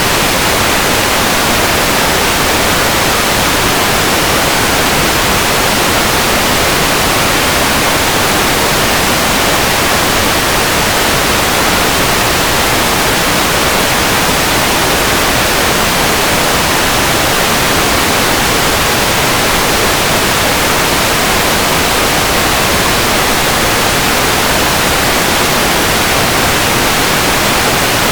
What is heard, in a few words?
rain; ambience; rain level 5